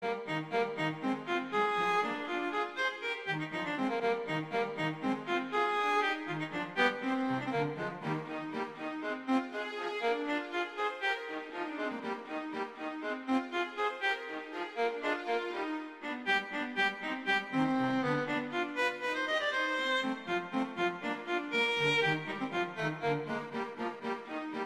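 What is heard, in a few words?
Duet, Rondo Allegretto, viola; Mazas; Duets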